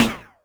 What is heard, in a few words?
snaredrum, Yamaha RM 50; Drum Samples; Snaredrums